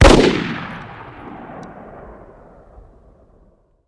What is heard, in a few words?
Gun AR-15 Single Shot Fire